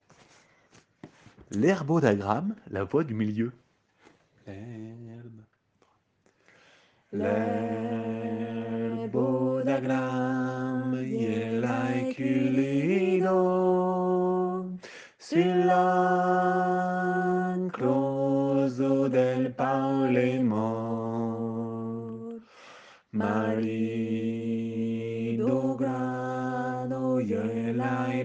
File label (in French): voix middl; chantons